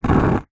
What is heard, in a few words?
nature fire flame air wind blow blast fast short